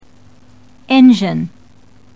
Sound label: ENGINE